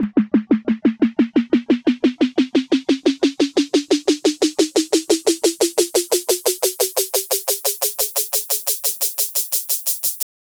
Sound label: Trap Snare Roll Rise 88 F-